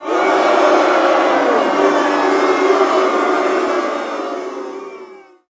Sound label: BMX Crowd Boo Medium; Crowds